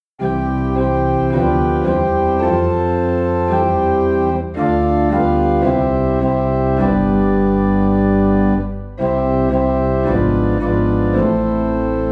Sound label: Organ Low in C; Like a River Glorious